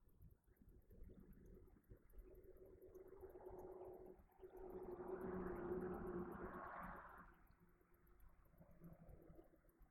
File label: Stairwell Static; poasttown elementary school investigation